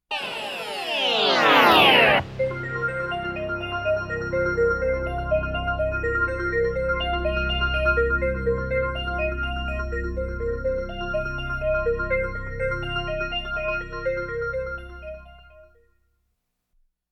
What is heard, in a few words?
mysterious